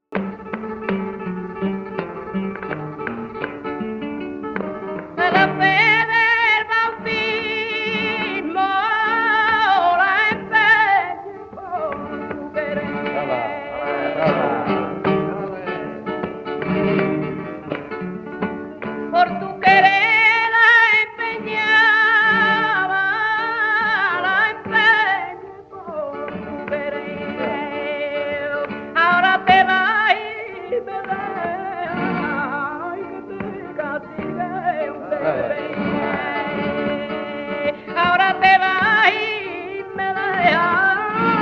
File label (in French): soleá del Mellizo, chant, guitare